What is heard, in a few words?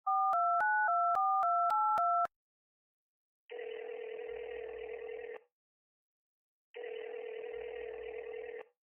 phone dial ringing; communication news